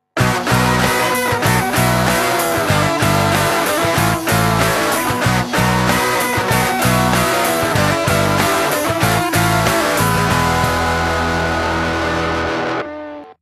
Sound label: Bamb; Nokia; N80 Asia